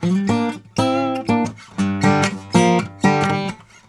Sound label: Blues Guitar Samples; ILIO; Fretworks; LICK